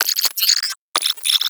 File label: Computer Beeps; Guild; Warframe; Misc Remaster